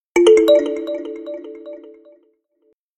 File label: High Priority default, notifications